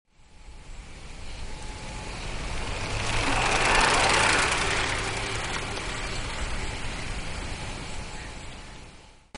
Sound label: bicycle